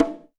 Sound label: Roland Juno D Perc Kit; Limited Edition